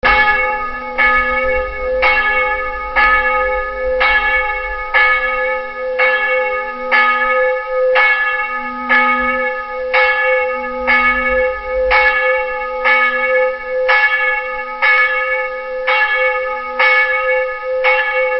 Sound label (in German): Klingelton Barfuesserkirche Augsburg